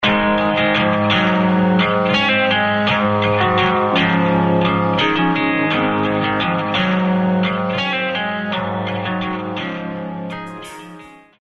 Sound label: Ringtone